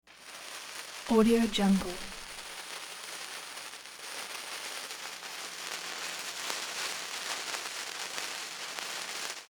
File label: fireworks fuse burning